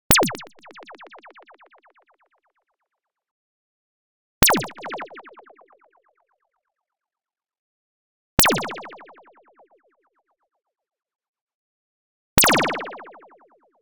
LASER Sweep Delay Clean; Anime Essentials

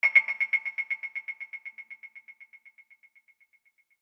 suspense loop; moodsounds; Audio Game maker Sound Library

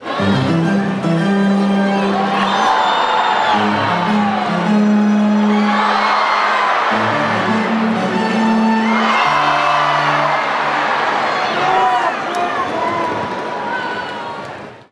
Baseball Organ Crowd Charge; Crowds

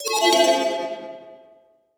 Pickup Magic Bells; SFX Kit; Pickups

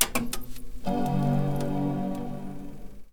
Computer Powerup Mac; SFX Kit; Foley - Misc

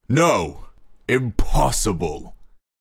Lose; Pitch Black Audio Pong

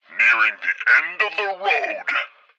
pre reload; Blind Drive

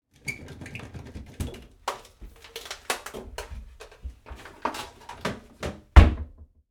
Blow Dryer Unplug and Put Away, Bathroom; 1001 Sound Effects

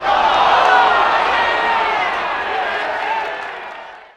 BMX Crowd Reaction "Oh"; Crowds

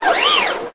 Menu Mechanical

